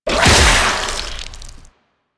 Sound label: Golem Gun Spit Attack Fire; Warframe; GolemRemaster; Plains; Attacks